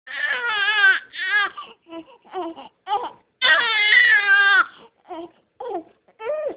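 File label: baby hungry, female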